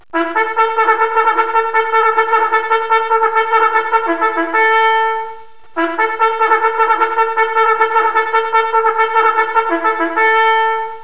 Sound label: rally